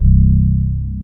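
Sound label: E-Mu Proteus FX Fretless 2 C1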